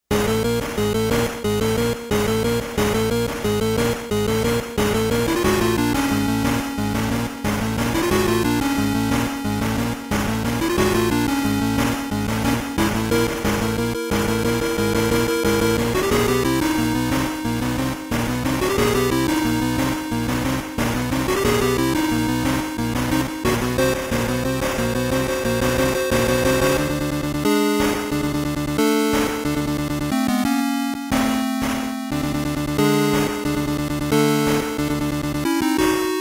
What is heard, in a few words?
ringtone; aai2; moozilla